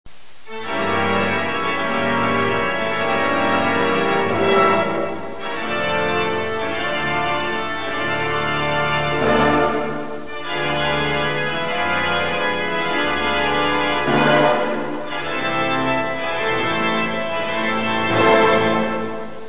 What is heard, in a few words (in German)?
Orgelaufnahme, Markuskirche